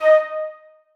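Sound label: flutes staccato d#4; Sonatina Symphonic Orchestra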